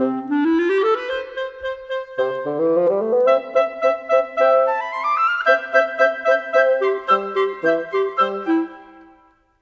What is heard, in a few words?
Cinematic, Orchestral Series; Sonic Foundry (Sony Creative Software); Orchestral Series VOL-3